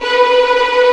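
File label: Vio tremolo B3; Digital Sound Factory Virtuoso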